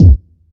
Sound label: bass drum single shot f key; kick drum samples